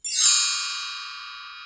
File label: Bell Tree; Digital Sound Factory Virtuoso